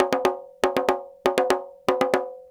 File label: Petia, World Percussion